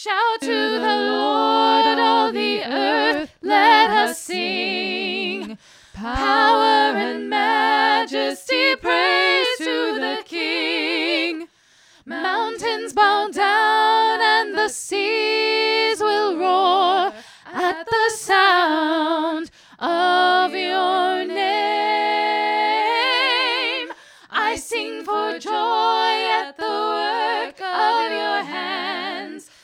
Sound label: harmonies